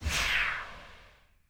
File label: misson complete